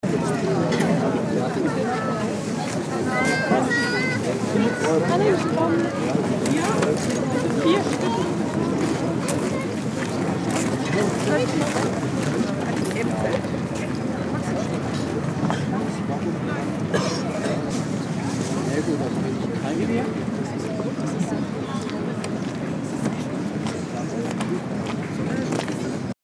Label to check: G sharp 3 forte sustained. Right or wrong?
Wrong